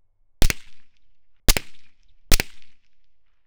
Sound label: Gunshot example